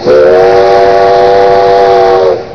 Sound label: chime